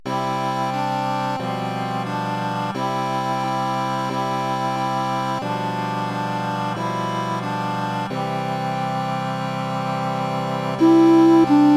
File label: Marys Lamb accomp